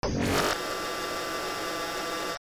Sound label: Reload, Gun; ProjectZ